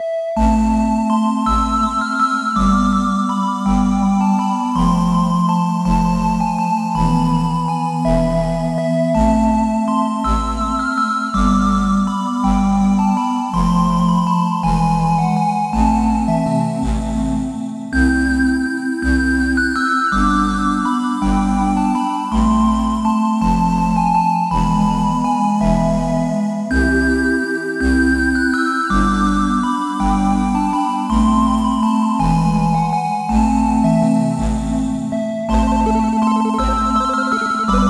Medieval Song; Shadows of Death; Level 12

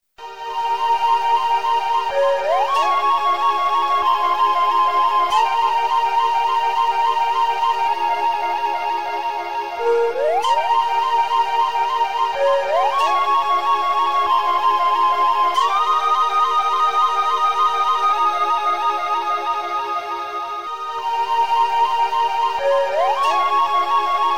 High Score, Amiga

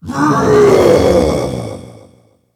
death die

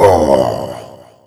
death hurt

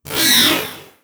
bat hurt

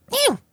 cat attack

